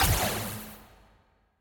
Laser burst

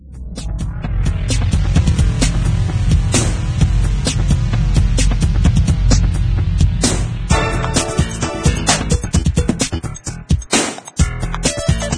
Menu Music; tiger woods pga tour golf ps1 gamerip 2000

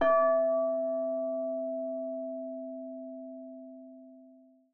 amb harbour

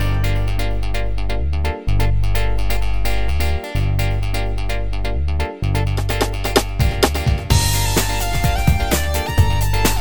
Multiball; Pure Pinball

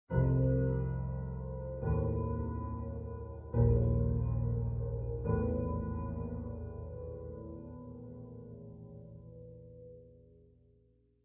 Night; Lethal Company

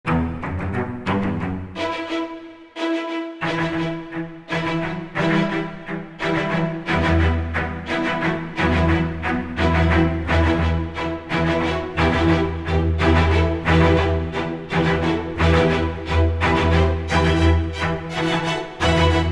Strings Ver.; plants vs. zombies battle for neighborville

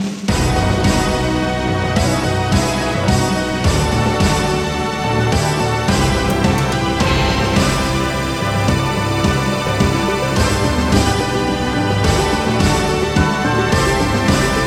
Complete; Power Rangers Lightspeed Rescue; N64; PS1

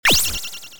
Ufo Laser; 3DS; Xbox 360; gamerip; doodle jump; android

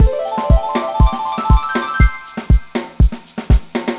samba pop; Touch Darts

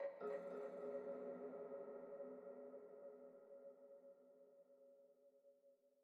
Env Field Night Cave

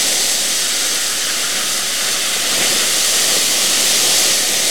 Gaz High LOOP

